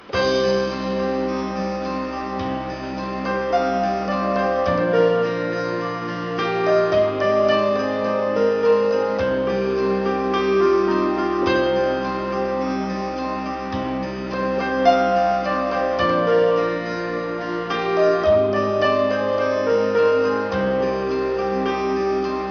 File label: guitar